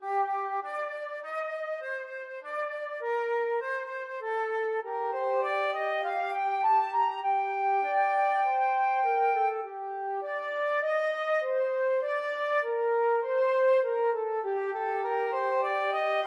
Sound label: submarine warfare FL duet, flute duet